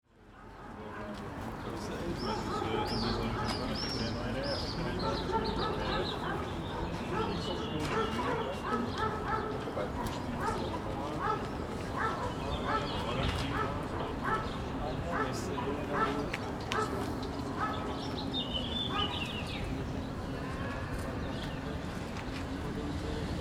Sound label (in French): entremelement belvedere graca